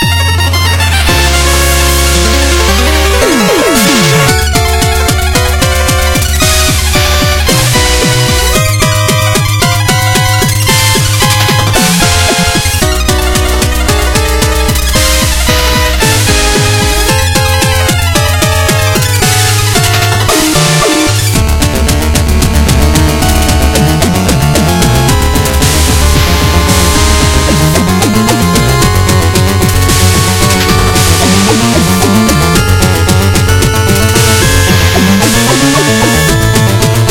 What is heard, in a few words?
Music Boss; Music KK Boss Birder